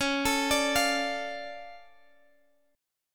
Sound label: D Minor Db, chord; Dm/Db arpeggiated